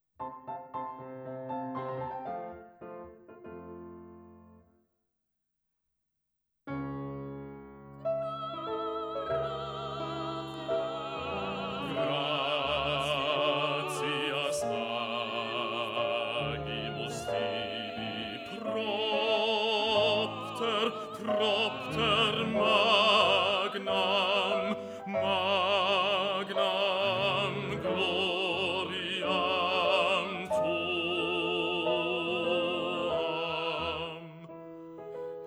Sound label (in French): basse; gratias